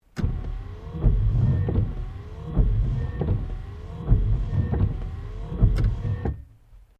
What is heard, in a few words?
Car Toyota Starlet wiper sequence; Toyota hire car in Japan; Cars (Sound Effects:Vehicles)